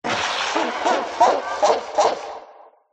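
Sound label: Psych Laugh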